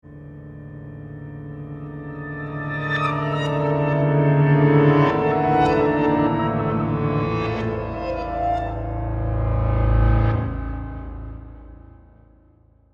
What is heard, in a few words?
Reverse Piano; Reverse, Whoosh, Suction, Ramp, Short, Horror, Production Element, Imaging Element, Accent, Transition; BlastWave